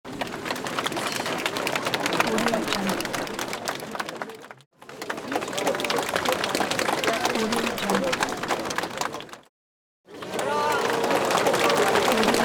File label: applause; teldrassil